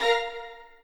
strings

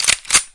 combo break; osu

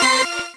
normal hit normal

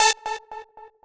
drum hit whistle

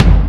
normal hit whistle

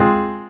Synth; osu

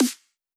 snare f4

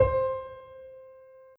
soft hit whistle; osu

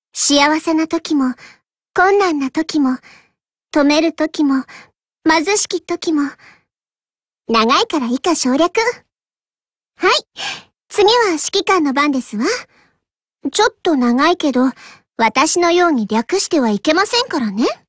applause

